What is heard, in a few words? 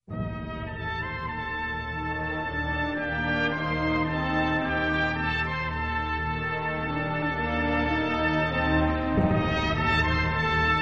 crystal notification; android 11